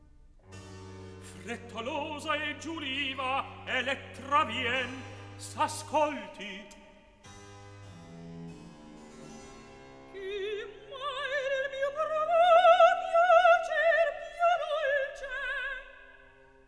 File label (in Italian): Atto secondo Recitativo; KV 366 Idomeneo, R¨¨ di Creta - 1780; Idomeneo, Elettra; Mozart; Operas